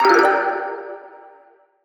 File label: tonal oneshot Gm; Pop; Slate; PASADENA Pop Sample Pack; Tonal One Shots